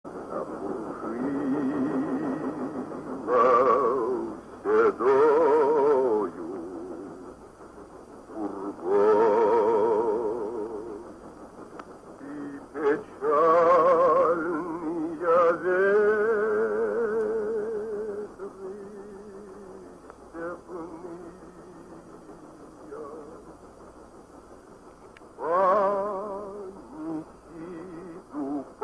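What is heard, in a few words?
Uguisu, Japanese bush warbler; 『コンペティション』1980年; 監督：島津保次郎; ラズモフスキー伯爵が船の上で歌う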